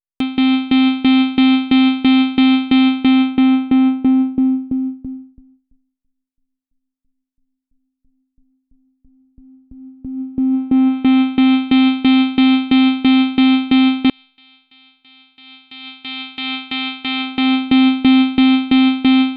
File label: Filter Sweep